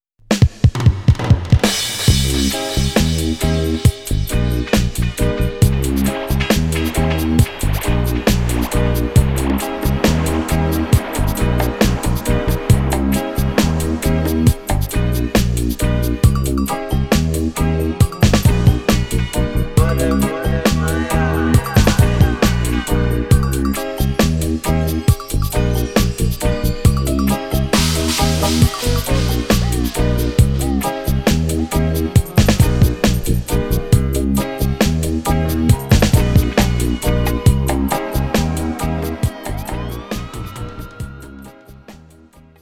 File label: Urban Dub